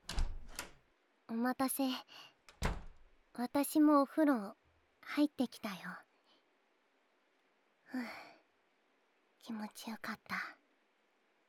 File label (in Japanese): 『炭酸耳洗浄』